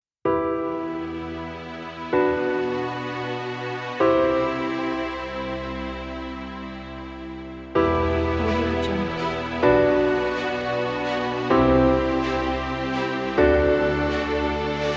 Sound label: classic ident